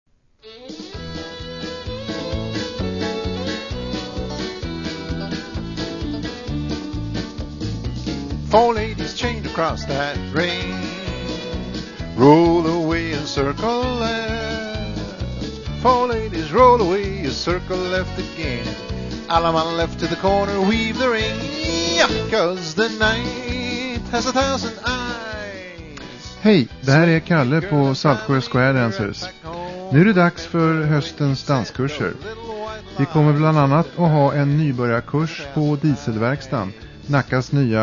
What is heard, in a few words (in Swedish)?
jingle